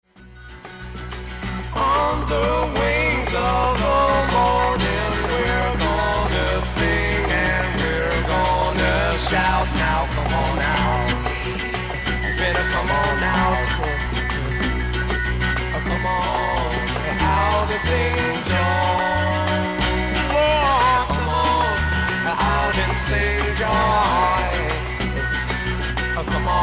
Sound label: sing joy